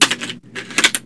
pistol reload, weapons

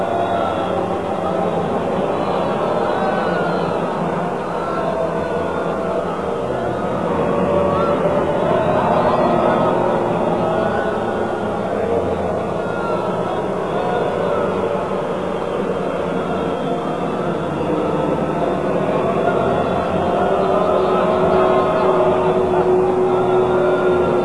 zombie moans

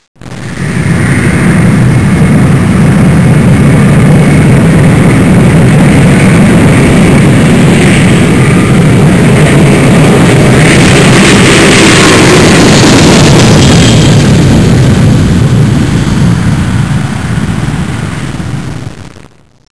aircraft takeoff

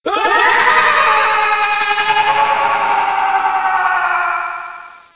scream

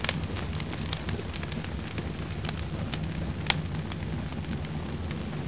burning slow

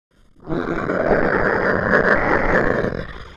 alert; scmod; humanerror; agrunt

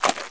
mud

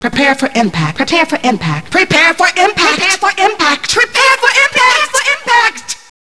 crash; mainframe

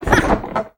jump; pacman sfxt

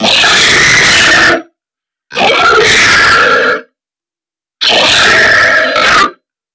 spider loop

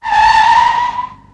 car screech

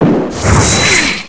Incoming

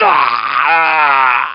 aaaah

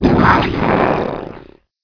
zombie poison pain

